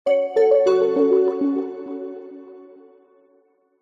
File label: alert dreamy soft warm drippy delayed bells finish end